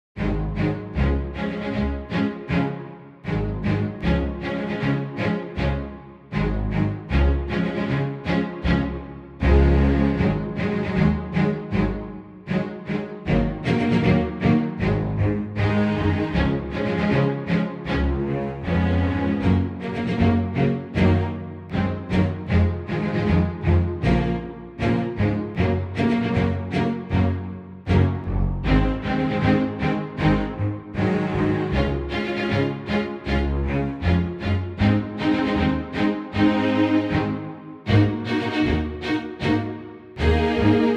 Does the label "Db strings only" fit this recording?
Yes